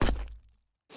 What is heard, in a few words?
ice land; teardown; ps5 windows xbox series xs